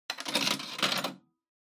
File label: Public Phone Coin Insert 100 Yen